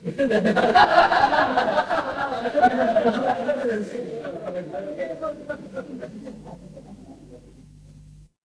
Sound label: crowd laughter